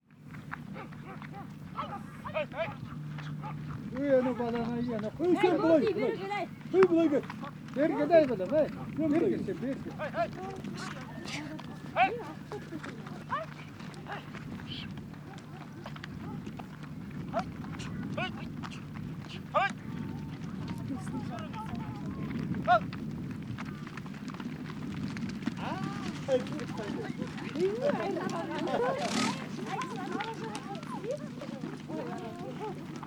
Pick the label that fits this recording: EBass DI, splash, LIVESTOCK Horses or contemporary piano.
LIVESTOCK Horses